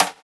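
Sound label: stick, Roland MV8800 drum kit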